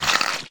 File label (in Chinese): ruchong; monster